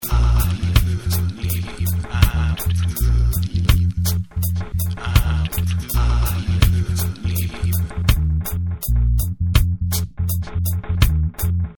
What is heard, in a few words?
instrumental